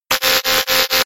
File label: error